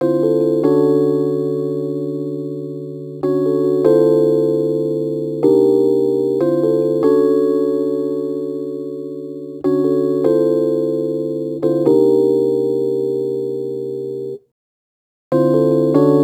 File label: Rhodes Attacks; Ueberschall